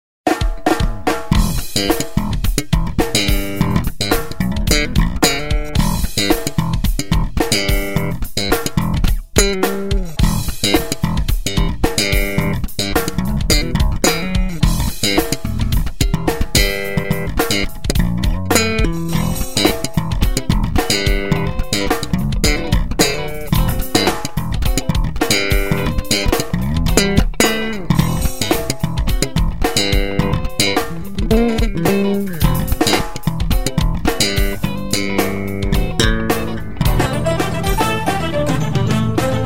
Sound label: slap funk bass